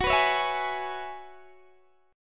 Gb 7th Suspended 2nd Suspended 4th, Guitar, DADGAD